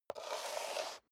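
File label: step high heels concrete slide long, footsteps; schritte; menschen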